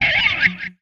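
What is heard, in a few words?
Music Guitar Pick Scrape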